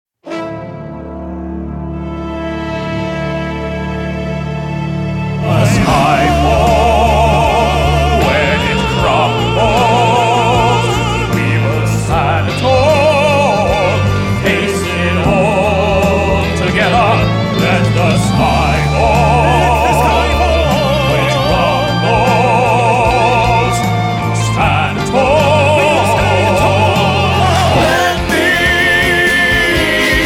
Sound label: Opera Singers Singing Waiters; The Opera Four